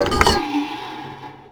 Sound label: pipes; WhiteOut